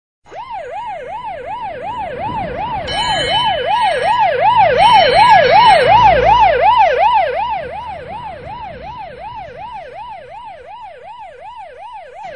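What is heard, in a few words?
sirens, tram horns, car noise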